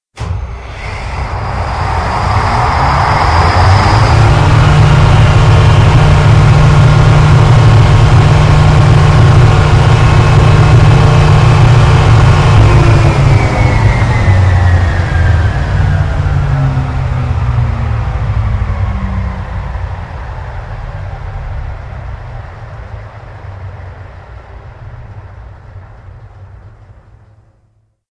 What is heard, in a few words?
big gun; Dystopia